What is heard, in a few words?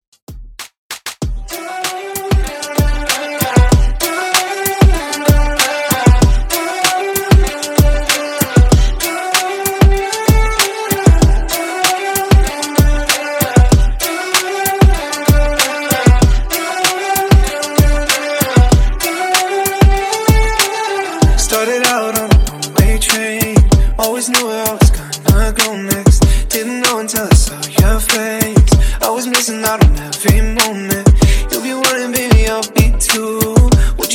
Top40 ReDrum 96 BPM